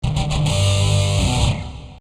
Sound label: Power Chord II